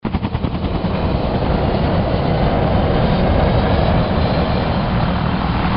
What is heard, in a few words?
transport takeoff